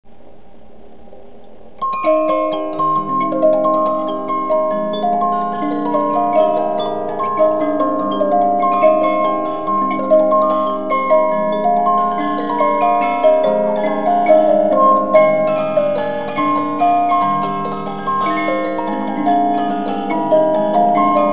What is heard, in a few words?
musicbox